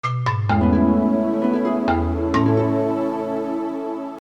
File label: startup; reactos